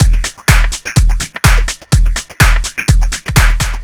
Dark Dirty Full, Drum Loops 125bpm, Nu Dance Electro